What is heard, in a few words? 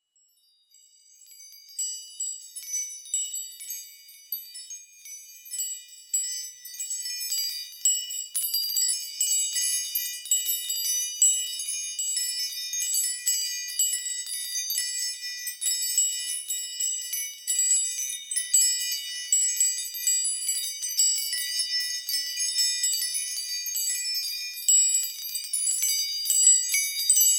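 sound of touching a crystal chandelier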